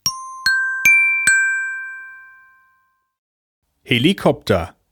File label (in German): Helikopter